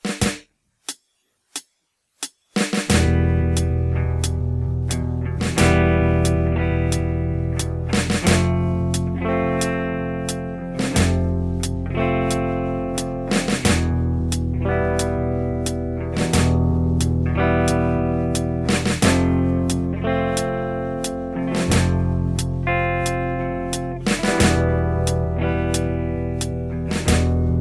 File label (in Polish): podklad flamenco 90; skala frygijska improwizacja; Zobacz jak łatwo użyć skali frygijskiej w gitarowej solówce; Kwadrans dla Gitary